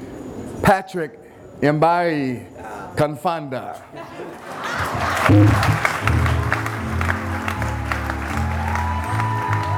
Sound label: ceremony